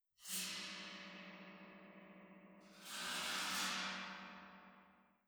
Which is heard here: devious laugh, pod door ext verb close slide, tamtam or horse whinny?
tamtam